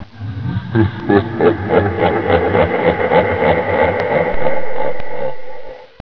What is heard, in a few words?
round berserker